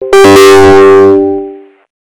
windows 11 error bass boosted

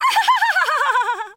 laughs; morrigan

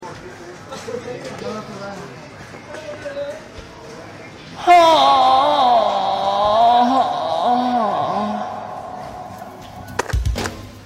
uhhhhhnnn

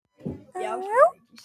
chicken; blahaj

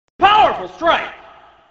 powerful strike

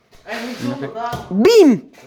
ahh

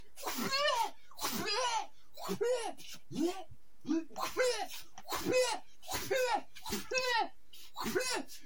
dwaaaaa

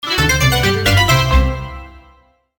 kick

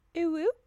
uwuwuw

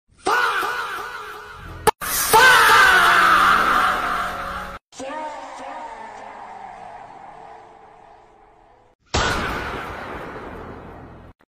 faaaaaah slowed; meme

